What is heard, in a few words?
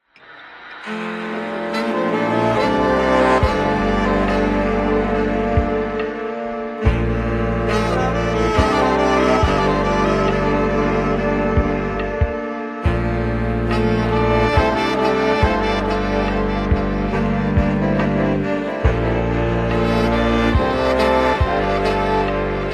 ambient